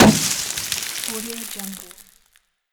confetti gun

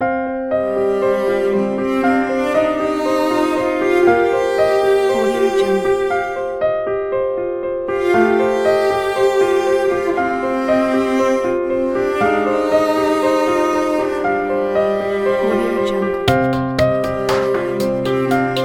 emotional cello and strings

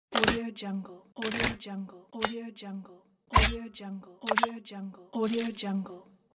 metal menu pack

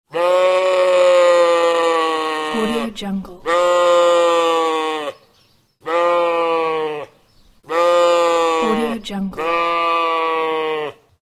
sheep baa

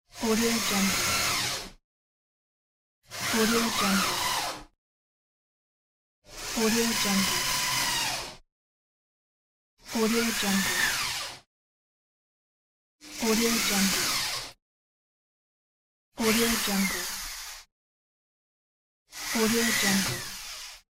electric screwdriver sound pack

